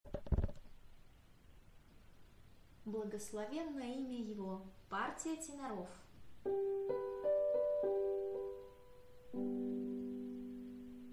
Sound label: tenor; Blagoslovenno Imja Ego